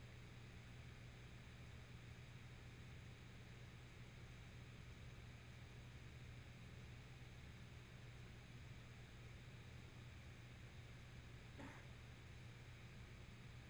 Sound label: guitar volume swell